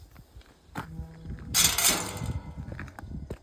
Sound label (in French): portillon sortie; BRUITS DE PORTAILS; BERNAC 16